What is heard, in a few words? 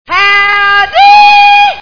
hee haw